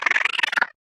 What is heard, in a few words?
Angry Screech; Lethal Company; Windows